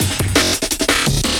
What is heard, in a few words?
Jungle Rocker Glitch; Breakbeats